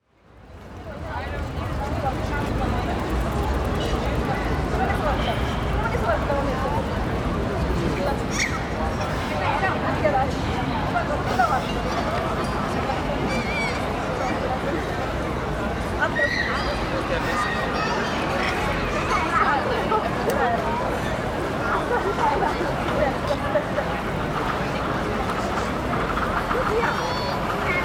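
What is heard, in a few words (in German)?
Touristenstroeme, footsteps, voices in various languages, rolling suitcases, and the bustle of intense tourism converge into a dynamic soundscape typical of the city center; Soundwalk; A Soundwalk for Klangspuren Festival 2025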